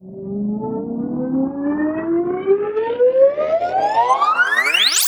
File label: Sweeps Up, Effects